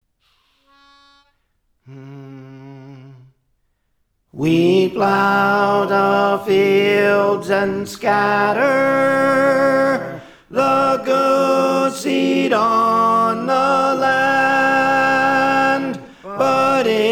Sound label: Tenor; Praise For The Lord Training